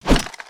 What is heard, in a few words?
spear end, weapons; aronwk collection; ndaudio